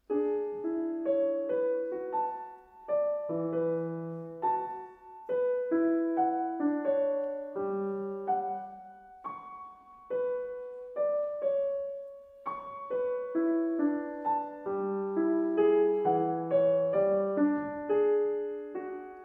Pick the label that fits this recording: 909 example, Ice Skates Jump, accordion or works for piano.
works for piano